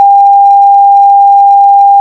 Cockpits ARK 800Hz; DCS

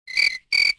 crickets; Civ4 warlords

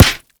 glass small

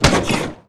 snowmobile suspension light; Call of Duty Modern Warfare

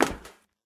walk proflist; Escape from Tarkov

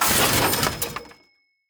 objects, World of Tanks

